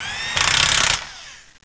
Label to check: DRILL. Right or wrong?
Right